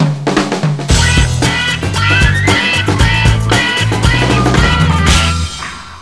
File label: catchy groove